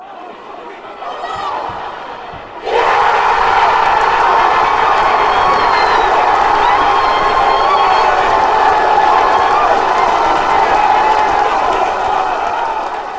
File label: goal, fanchants